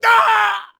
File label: hurt heavy